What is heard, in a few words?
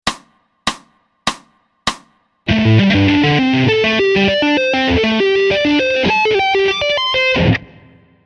Part Pent Mult Fing (SLOW)